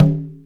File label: Conga H, Linn LinnDrum Percussion; Drum Samples